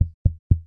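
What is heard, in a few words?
sounds of tapping finger on microphone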